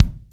KICK, LA KICK; AKAI S6000 CD-ROM - Volume 3

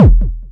kick, Korg Electribe ER-1

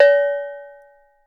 agogo bells; Voice Crystal - Steve Reid Definitive Percussion

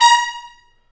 BRS TP, Roland, Stabs; Roland L-CDX-03

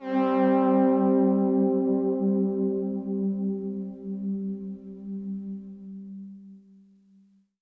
Pad C, Synth Prophet One Shots; Electronisounds

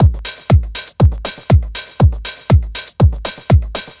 4 floor beat, trance

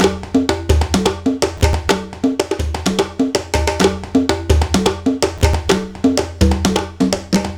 Rhumba, Percussion Loops; Drums

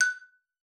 F#5, xylophone; Xsample VOL-4

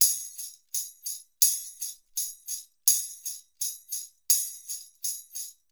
ER TAMB, LOOPS, Percussion; Sampleheads; NYC Percussionworks; ER LOOPS 84

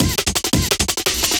Jungle, Breakbeats

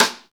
snare, Roland

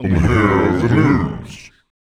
VOICE FX, Dance Vocals

